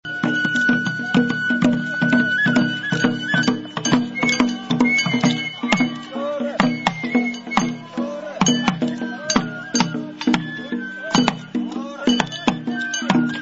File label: banjo